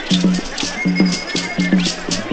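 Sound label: Afro jam, African rhythm; Crypton - DrumBase5000; AFRICNRHYTHM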